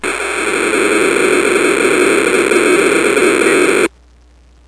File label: rocket